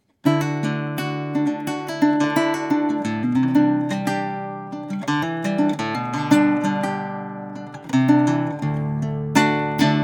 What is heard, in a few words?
Guitar